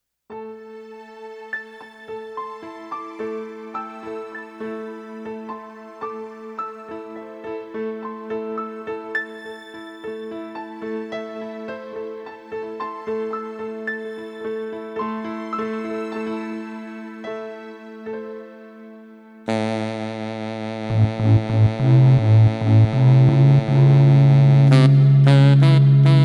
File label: Instrumental Interplay